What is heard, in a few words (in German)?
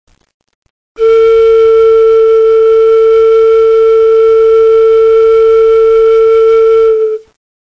Luftpumpe; Luftpumpe a